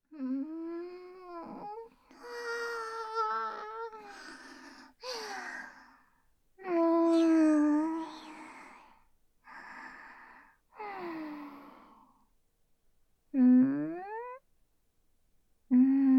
耳舐め・キス, ASMR

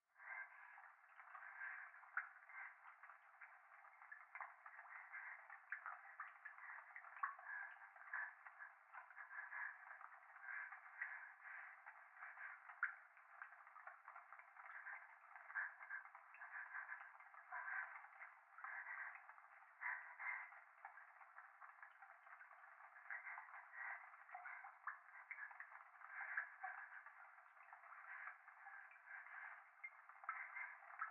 風呂 右耳快感吐息 雨上がり

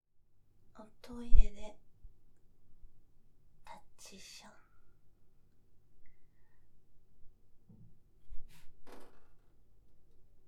おトイレで！立ちション！オシッコですッ！

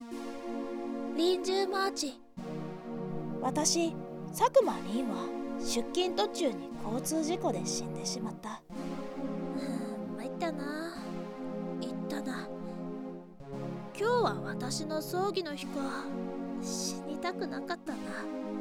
アエギ声ループ; リンジューマーチ

